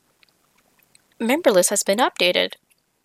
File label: alert